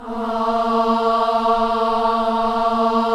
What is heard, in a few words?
KIDS CHOIR, Gospel; Spectrasonics; Vocal Planet Vol.1 Gospel; akai